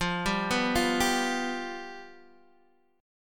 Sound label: F Suspended 2nd Flat 5th, Guitar, Open D